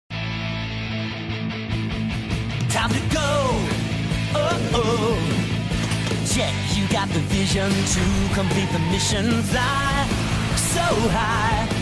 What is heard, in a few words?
song; Super wings